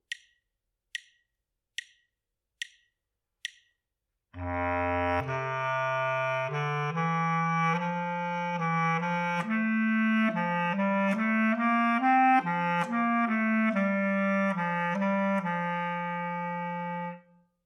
melodic error detection

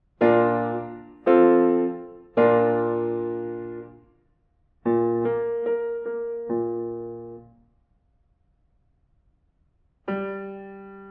diatonic collections

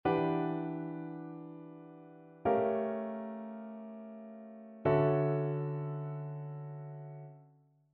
ii V I progressions; ear training

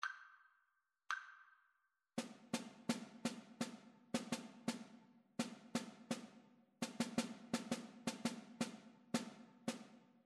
rhythmic dictation

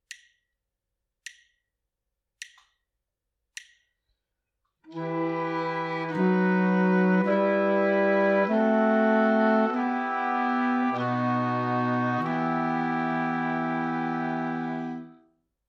harmonic dictation

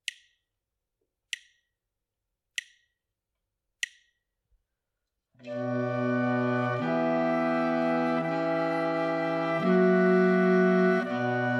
harmonic error detection; ear training

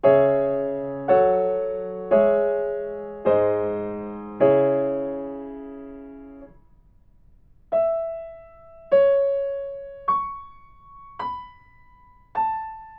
melodic fragments

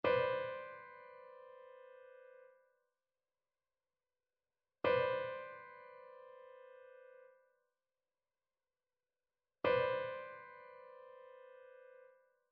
trichord; trichord identification; eartraining

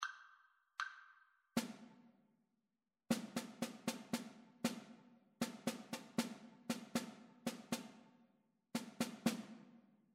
rhythmic dictation; ear training

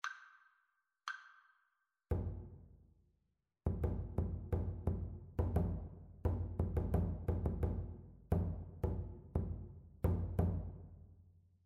rhythmic dictation

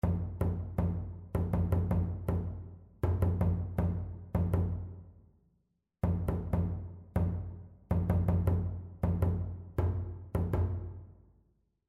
rhythmic error detection

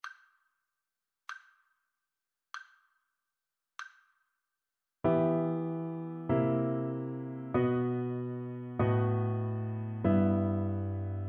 harmonic dictation; eartraining

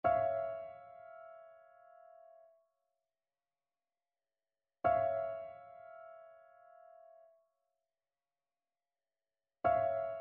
trichord; trichord identification

